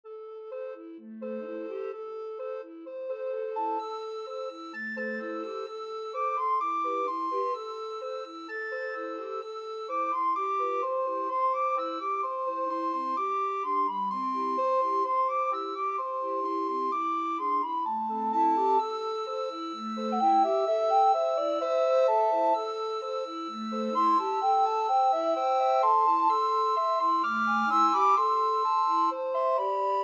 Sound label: chanukah, recorder quartet